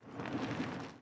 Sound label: catapult walk; propack